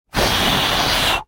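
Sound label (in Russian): duet silno, duet strongly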